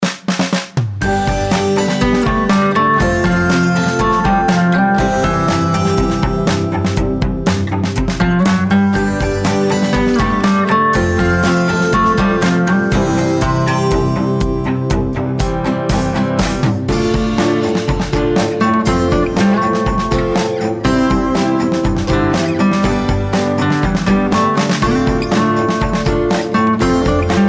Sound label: Campfire Classic; The Sims Mobile